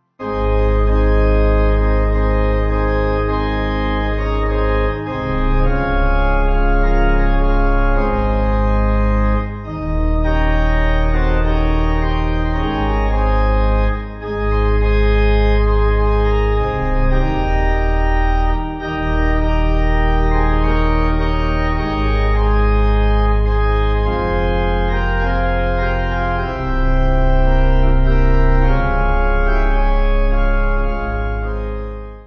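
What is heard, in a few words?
Pipe, Pipe Organ backing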